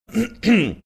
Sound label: clearing his throat